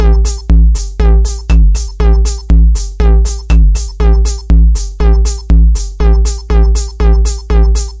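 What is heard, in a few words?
robot 909 bouncy, 120 bpm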